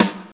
Fat Snare Drum Sample F# Key, steel snare drum sound tuned to the F# note